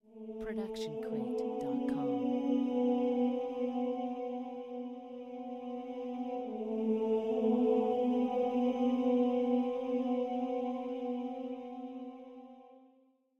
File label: monk praise; soundscrate